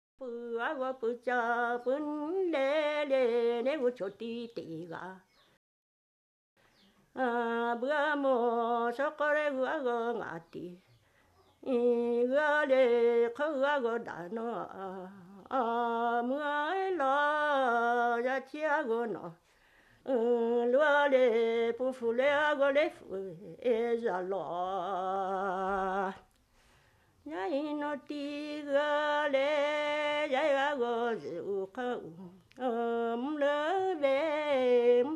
Black Lahu song, unaccompanied song sung by female singer